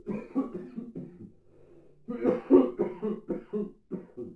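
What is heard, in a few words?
cough; Mafie 1 cz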